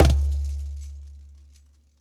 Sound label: Percs